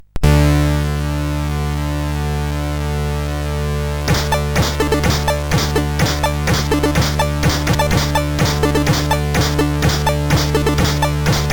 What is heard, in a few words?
Metal Beat, CSG8580R5; Metal Masters